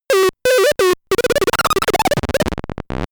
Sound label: talking arcade